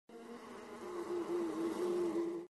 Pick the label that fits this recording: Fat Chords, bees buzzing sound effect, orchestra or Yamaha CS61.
bees buzzing sound effect